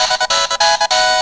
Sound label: Bonus Perfect; Score perfect in a Bonus Round; zelda